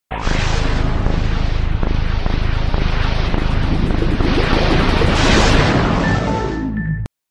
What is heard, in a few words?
Xbox